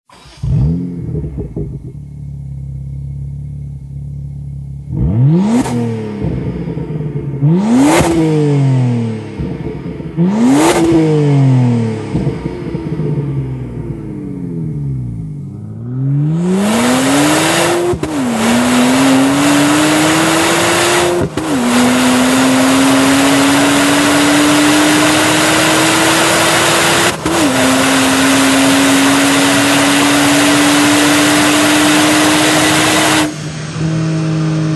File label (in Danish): BMW 1m standard udstødnings lyd